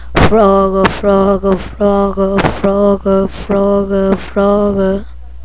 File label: frog